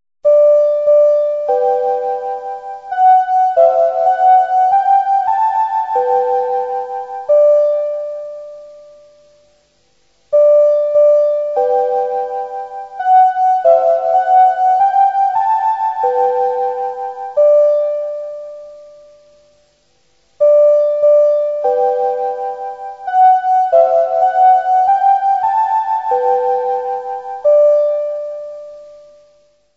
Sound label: interval signal